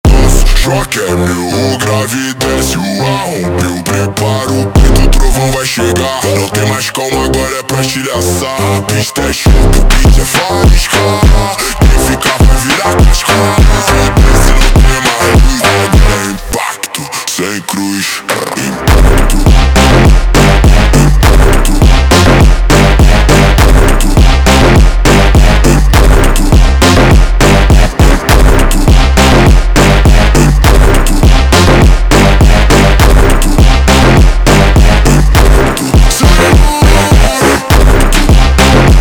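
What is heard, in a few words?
slowed, phonk music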